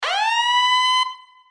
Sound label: sub alert